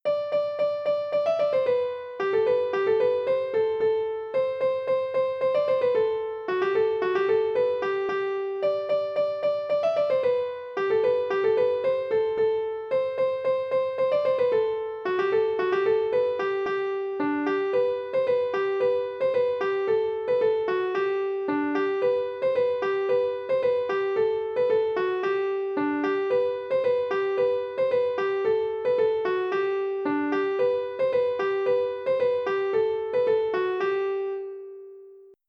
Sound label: polka; boeuf trad